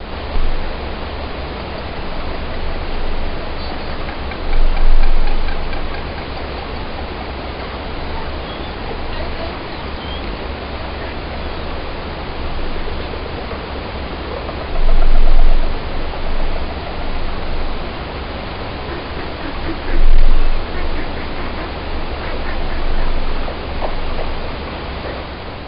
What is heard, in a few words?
deep sea fish grunts